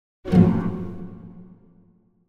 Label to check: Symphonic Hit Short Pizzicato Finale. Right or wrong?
Right